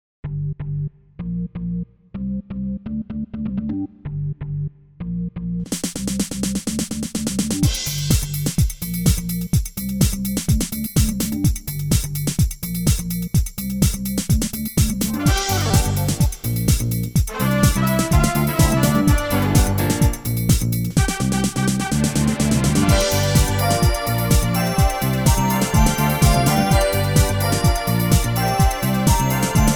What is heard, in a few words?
a vox wah